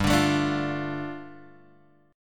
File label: G 7th Suspended 2nd G down Guitar Open G